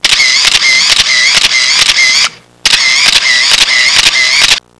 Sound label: CAMERA; HAUSHALT